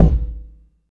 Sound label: long steel kick drum sound A key, kick drum tuned to the A note; kick drum samples